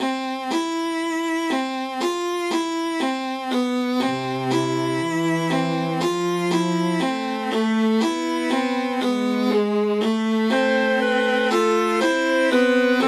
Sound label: rag trio